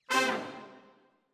Brass Over

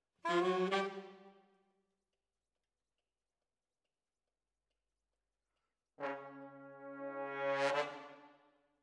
Brass

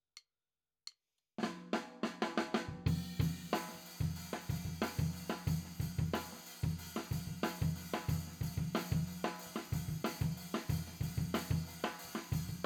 Floor

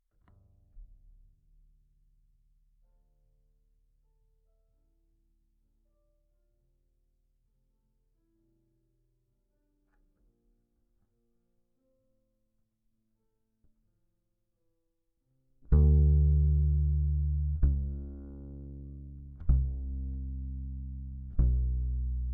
Bass DI

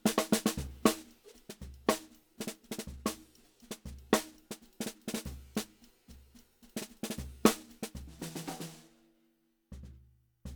Snare Bot

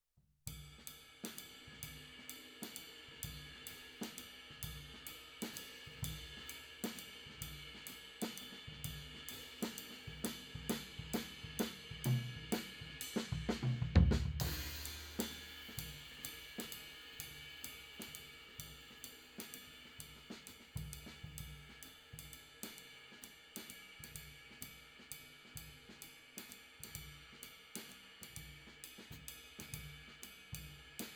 Floor

